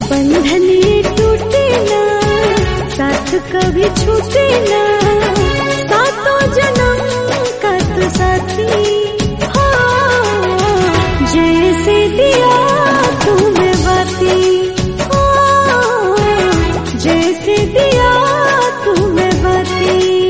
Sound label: hindi song